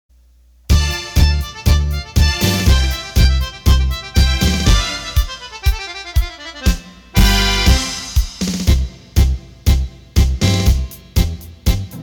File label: Tango